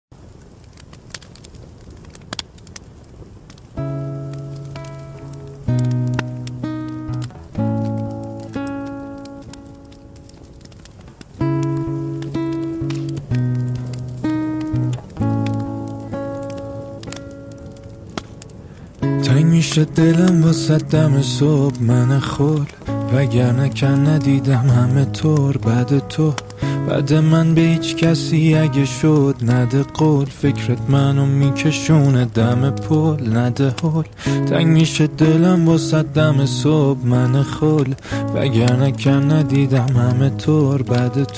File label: Guitar